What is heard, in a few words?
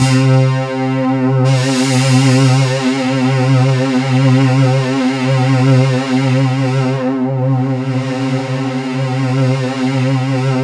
C3, SynthLine; 90 s Sample CDs; NiceBeats; SynthLine VOL-16